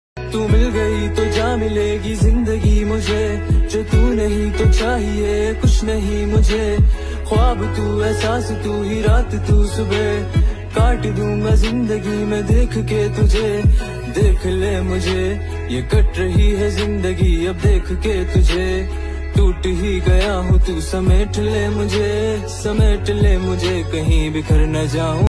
hindi rap ringtone

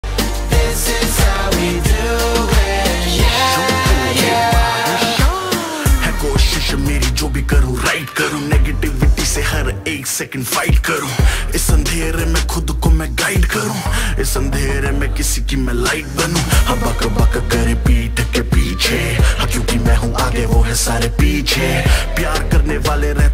rap ringtone

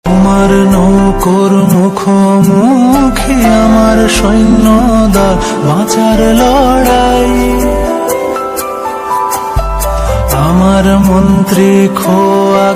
bengali ringtone

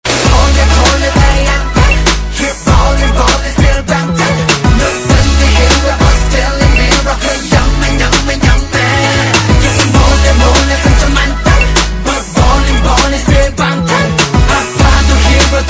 korean ringtone

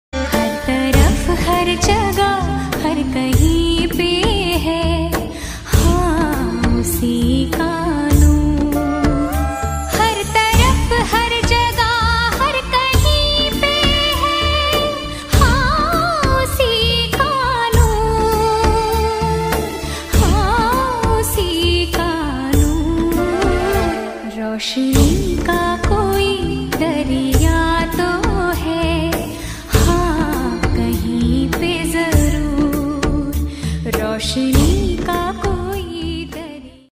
hindi bhakti ringtone

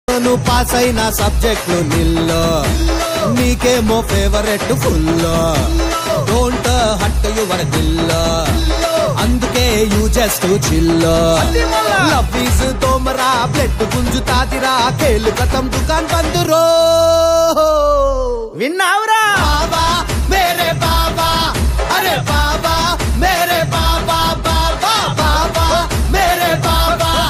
tamil ringtone

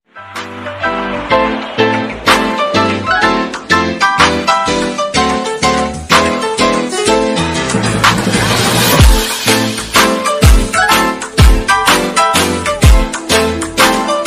BGM ringtone; Mr Bean

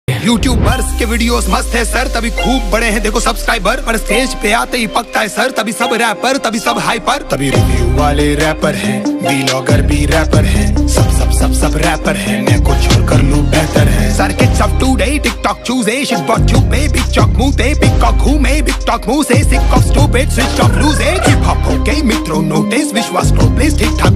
hindi rap ringtone

